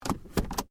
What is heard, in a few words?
Hyundai Accent Auto Gear Shift; Hyundai hire car; Accent 20 08; Cars; Vehicles